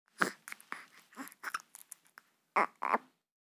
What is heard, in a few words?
baby three weeks old babble